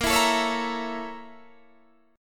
A sharp Minor Major 7th Flat 5th A sharp Guitar Open D